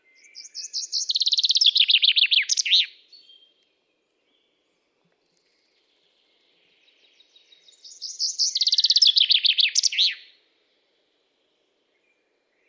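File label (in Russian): Zyablik, chaffinch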